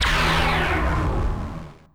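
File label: Laser Fire Exterior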